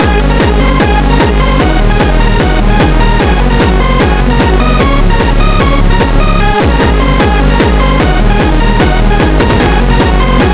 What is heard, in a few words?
EPIC HARDSTYLE KICK TEST